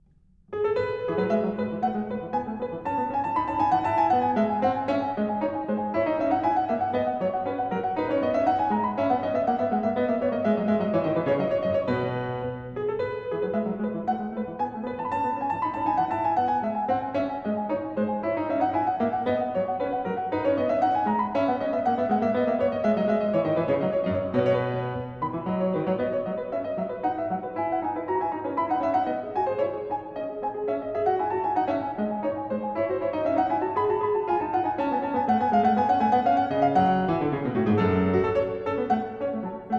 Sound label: Bourree, KLAVIER; French Suite No 6 In E Major; BACH